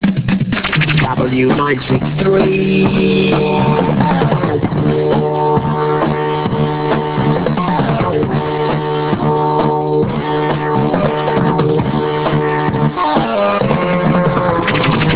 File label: phaser bed